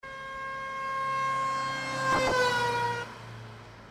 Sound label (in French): Son Doppler klaxon